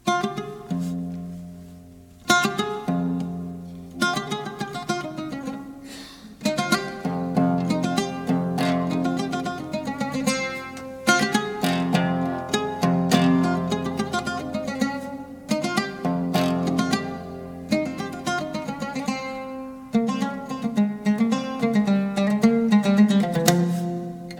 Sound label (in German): musik